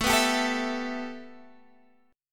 A 7th Suspended 2nd A, Guitar Half Step Down

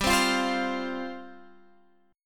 G 7th Suspended 4th G; strummed; x,10,10,10,13,13

